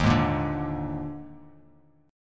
D sharp Major 7th Suspended 4th, D sharp, down, Bass Standard; strummed; D#M7sus4; JGuitar